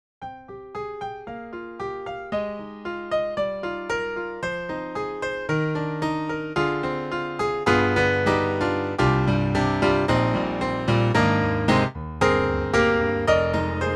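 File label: Close Miked C7, piano; IK Multimedia; Sonik Synth 2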